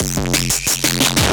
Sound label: Industrial Crunch, Drum Loops